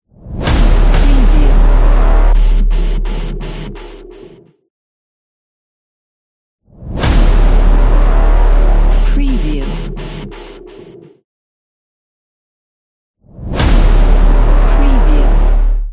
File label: massive short hybrid logo